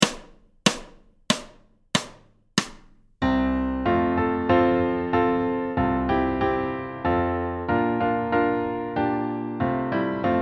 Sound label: Snare Solid Rock accomp; Level 3